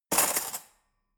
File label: cutlery fork drawer place hard